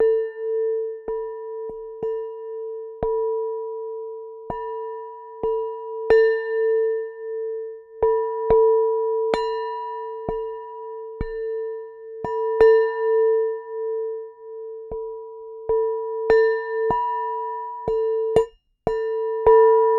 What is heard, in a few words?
Glock Beater Montage Hits, Large Wine Glass